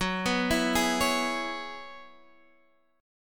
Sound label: F sharp 9th Suspended 4th F sharp Guitar Half Step Down